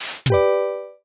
eyecatch paper snap bloom

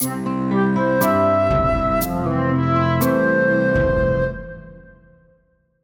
jingle travel map

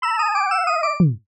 coins roll stop

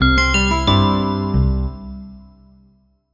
jingle success short; buttons ui

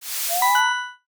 light streak pass

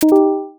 stream comment ping

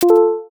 stream mic on

